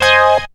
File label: CHORD; SoundFactory; SMPMANIA; EFFECTS